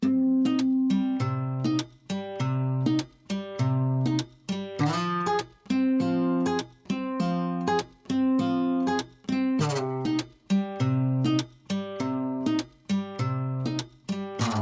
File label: Latin Flava 100BPM